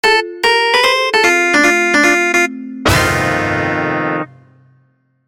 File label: JINGLE; Ochi